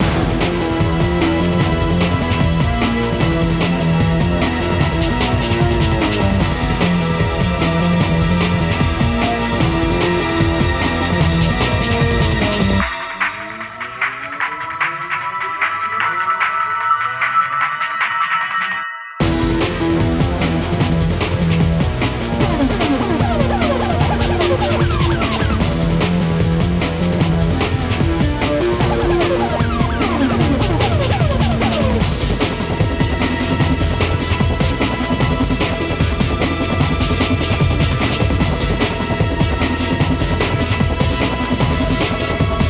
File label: tension; PS Vita